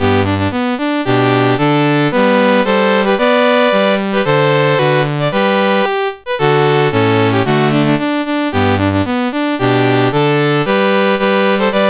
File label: CSG8580R5, National Anthems; Lebanon